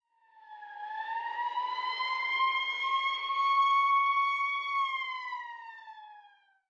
Ambience Police Siren

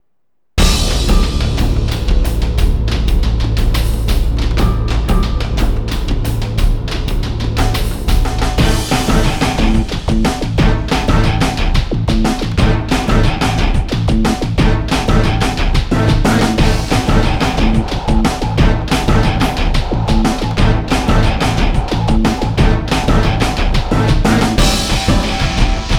Boss Battle

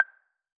Cursor

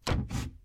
Cupboard Slide Out; Lethal Company

